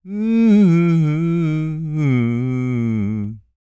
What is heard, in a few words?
SING, Blues Soul